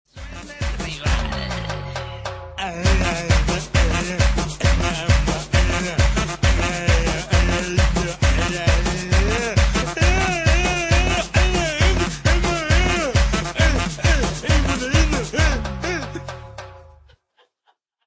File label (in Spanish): cumbia tribalera